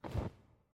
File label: cloth drop